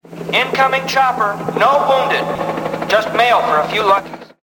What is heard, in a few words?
Mail